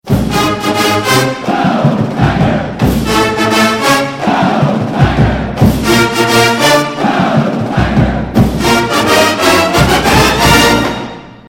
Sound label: Geaux Tigers Cheer